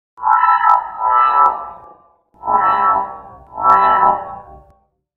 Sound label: Robot Idle Scan; creatures mechanical; Lego